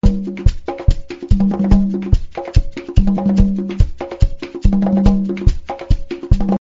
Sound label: drum